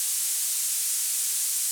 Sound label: normal slider slide; AriizuCA's Skin V3.17.6 Tail Yellow